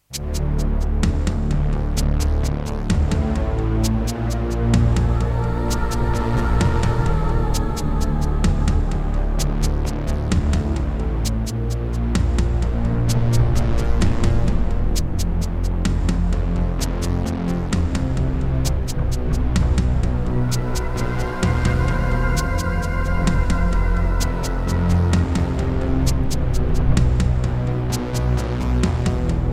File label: Alesis NanoSynth; Descent II